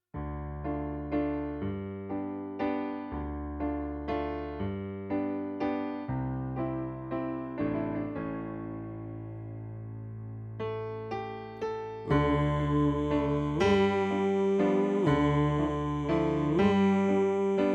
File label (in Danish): Tenor; oevefiler